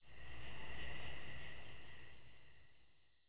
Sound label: crickets fade out